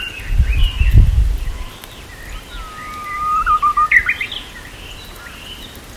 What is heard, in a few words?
uguis